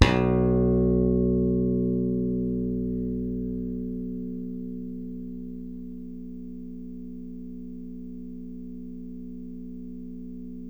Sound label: SLAP BASS, Electric Basses; PlatinumPhattCD2; E-MU Producer Series Vol. 8 – Platinum Phatt